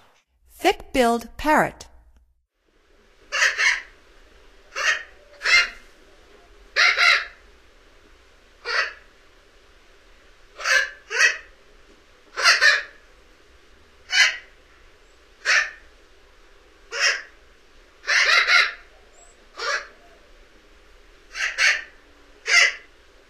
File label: Thick-billed Parrot; North America; Stokes